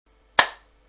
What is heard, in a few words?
texture clap 7